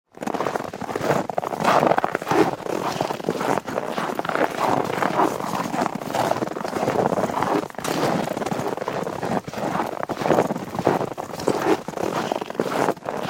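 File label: sounds of footsteps on ice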